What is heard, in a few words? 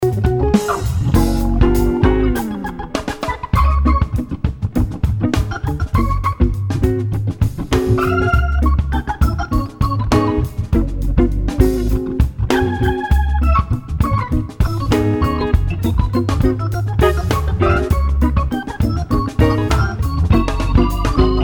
stomp solo, organ